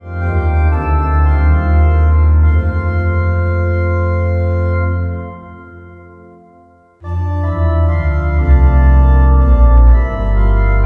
organ; west park; Hymnal 1982

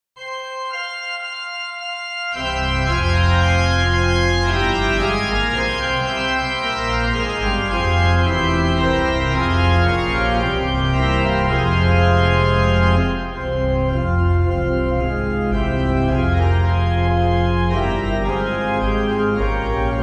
organ, hymn; halifax; Hymnal 1982

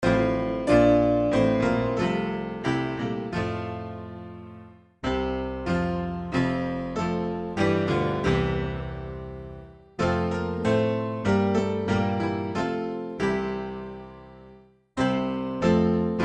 Hymn; I Love Thy Kingdom, Lord; Hymnal 1982

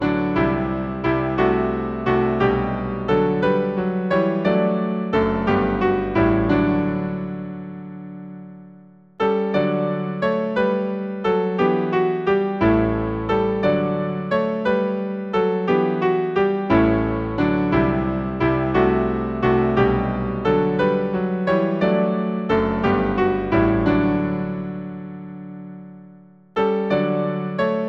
Hymn piano; Now greet the swiftly changing year; Sixth Night

